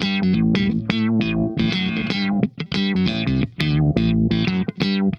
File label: SHOT, Funk Guitar; 90 s Sample CDs; Zero G